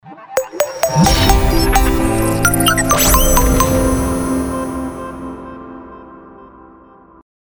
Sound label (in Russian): Авторский аудиологотип; Нового-Телевидения